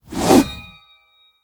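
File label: two-handed Epic Staff Underlay Attack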